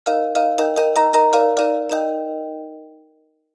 success; Piloteer

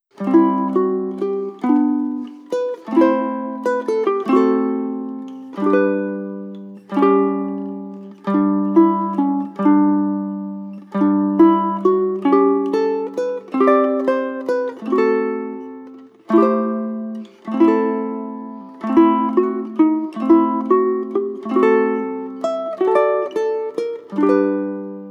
Underwater BGM, ukulele

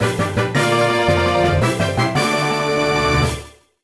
Bug-Catching Contest - Winner

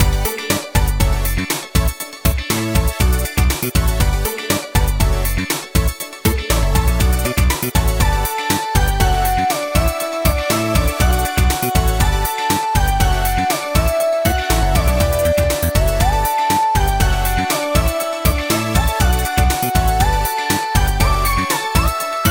menu supply room

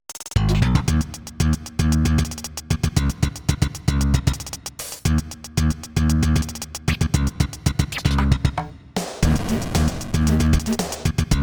Weak Battle; block tales demo 1; roblox

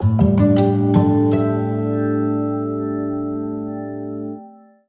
JINGLE levelup

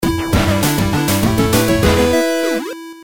Level Complete; Star Vs. The Game

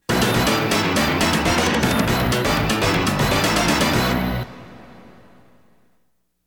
Stage Clear; Konami Game Music Collection Volume 0; Go Speedy!

